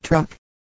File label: truck